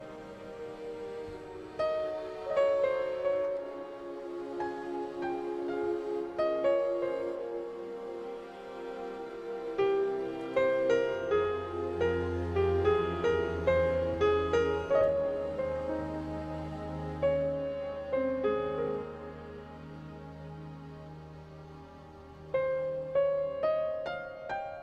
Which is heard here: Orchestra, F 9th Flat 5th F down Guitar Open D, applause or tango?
tango